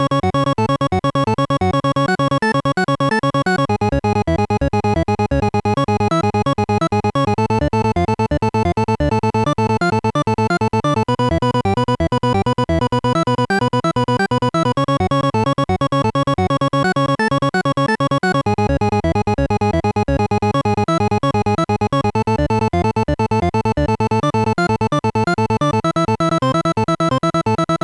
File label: Chip Bit Danger